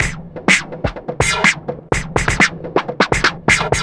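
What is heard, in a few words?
Autowah, percussion; перкуссия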